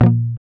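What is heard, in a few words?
GUI A#, acoustic guitar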